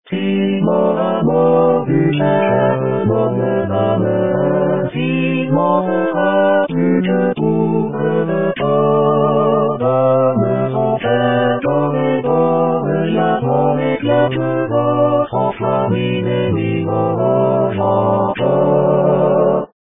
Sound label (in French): Refrain SATB